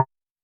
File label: Perc Wood Low, Vermona Mono15 Drums